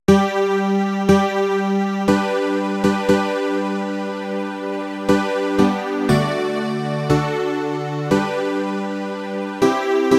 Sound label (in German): G-Dur Atmosphere